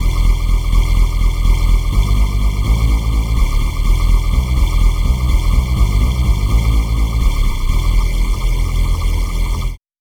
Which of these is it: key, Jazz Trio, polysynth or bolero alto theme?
polysynth